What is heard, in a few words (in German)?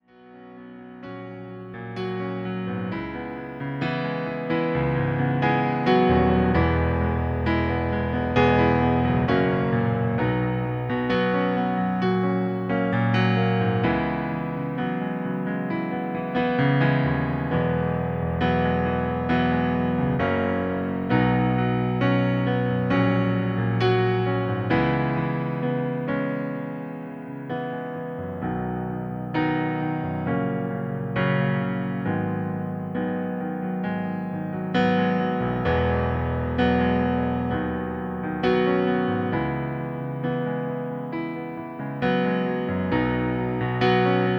Pianoplayback H-Dur